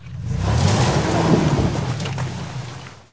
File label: Auto Tires Peelout Mud; Automobiles